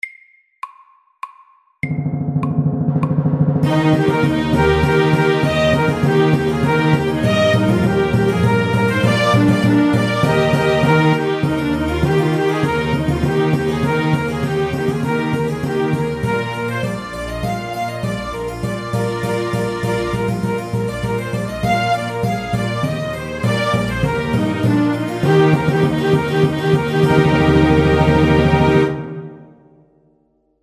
Air de trompette FORT

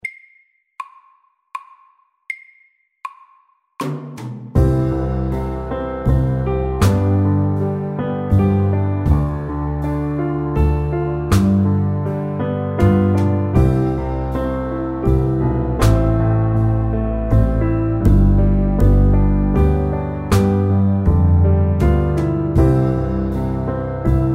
Soft rock MEDIUM 80

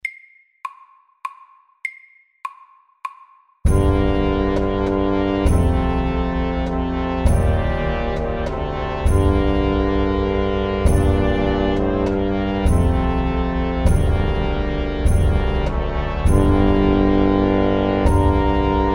Hemiola FAST 100

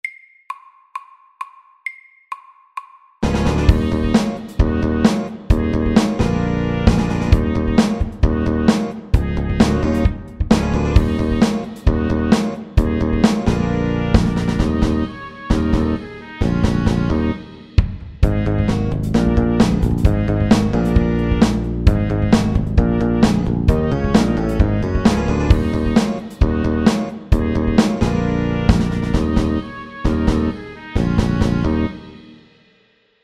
Funky

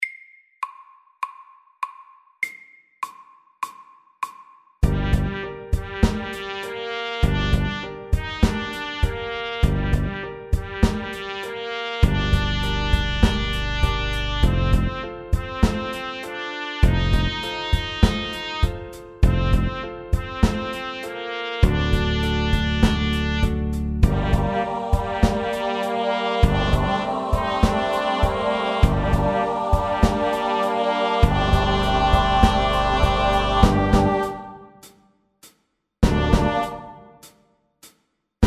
A minor rap SLOW 100

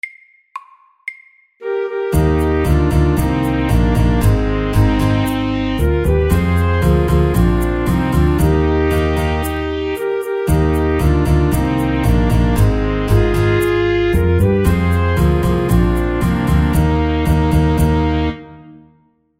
Mariachi band MIDDELS

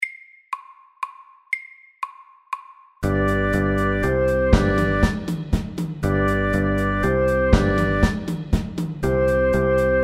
Fusion

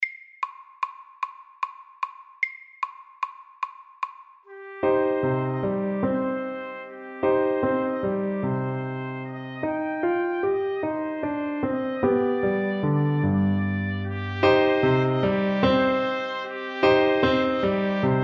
Bugle horn

